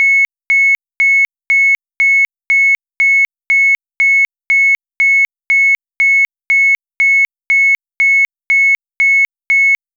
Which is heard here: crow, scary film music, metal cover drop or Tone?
Tone